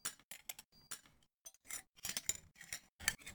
Belt Metal Clicks; Lucius III

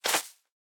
Spawnin Land Feet; boomerang fu

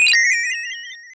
Unused Jingle; Kids Communication Pico

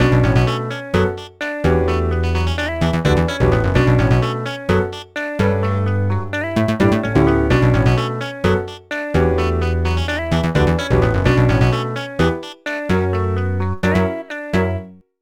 Hiphop

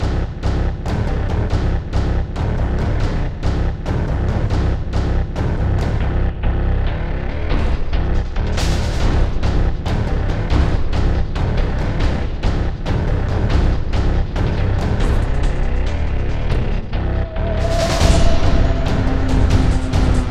Locust Percussion Concept; Gears of War 2

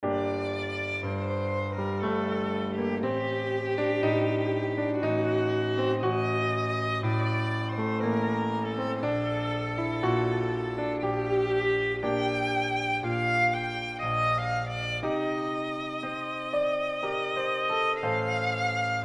Adagio Violin; Piano app by Yokee

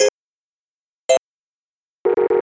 Menu Sounds; cruis'n exotica; arcade

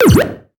Common Power Cookie; Pac-Man Championship Edition 2 Plus

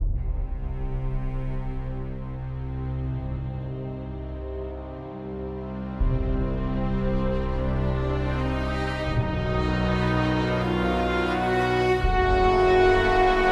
Cinematic Music - Honour Stinger

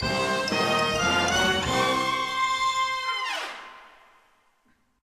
Sting; lego the incredibles 2018 pc

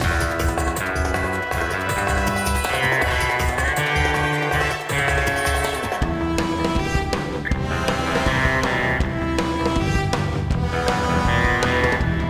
Battle START

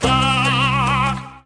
Fly; Lego My Style Kindergarden macOS Windows 2000

